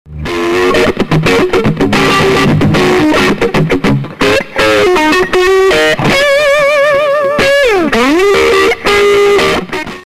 bootleg jaw breaker, guitar effector; guitar effector variation

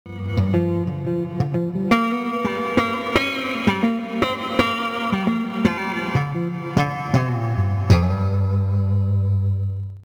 boss rv5, effector, guitar; guitar effector variation

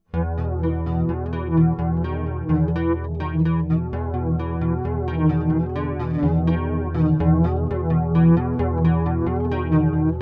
Uni Chorus Flanger, effector bass

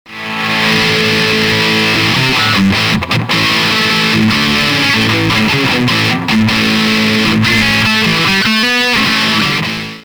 boss ds1, guitar effector; guitar effector variation

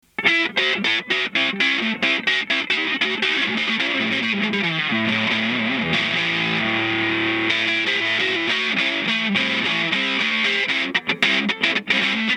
jacques tubeblower, guitar effector; guitar effector variation

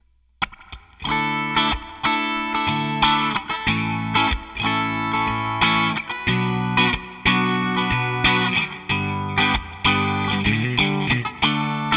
legend em12n strat; eminence

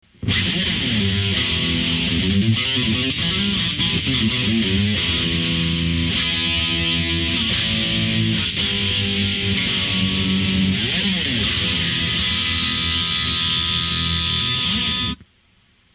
Rocktron RT1900, effector

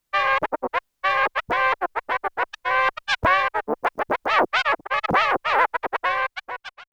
trance, dj scratch

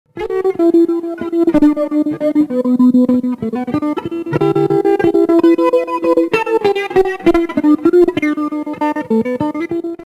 boss bf3, guitar effector; guitar effector variation

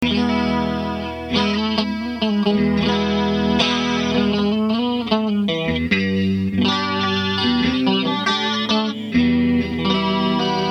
maxon cs550, guitar effector; guitar effector variation

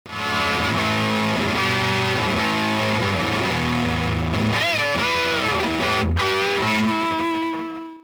sobbat db3, effector

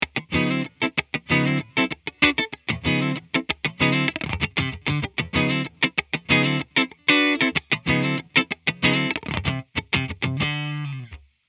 Maverick Full attenuation Funky; eminence; Funky rhythm - full FDM attenuation; Tomo Fujita

